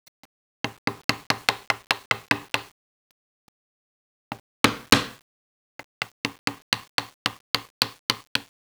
lil hammer; toyshop

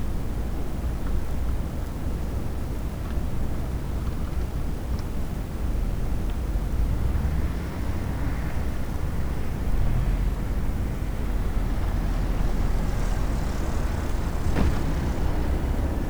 snow; city snow2

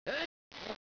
sneeze

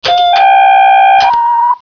Key Seq

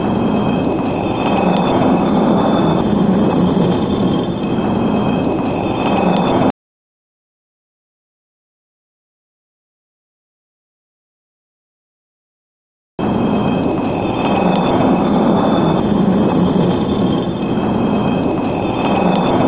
concrete scrape

